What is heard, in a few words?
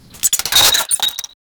broken sound